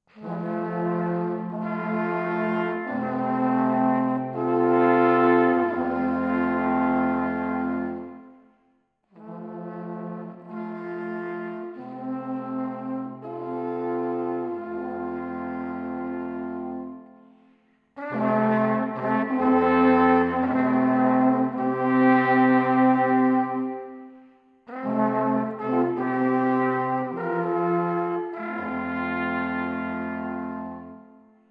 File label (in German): musik